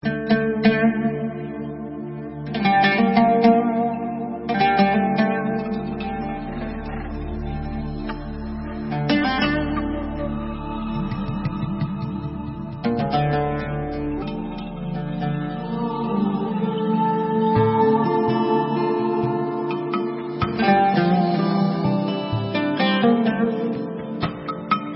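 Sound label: Instrumental, Israeli Dances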